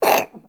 Kitten Cough Hairball; Warframe; Catbrow

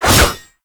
Bayonet Melee Whoosh; Warframe; Tenno

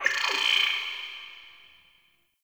Class Lesson Slide; Warframe; The New War; Zariman Class Quiz

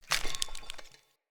Foley Step; Enemy; Corrupted Sproutfolk

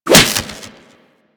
Whip Impact Default; Warframe; Weapons; Corpus; Impacts

